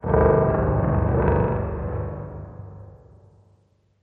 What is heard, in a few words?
amb gen low metallic, ambient; Half Life Model Pack